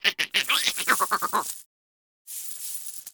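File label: crab good free spins; netent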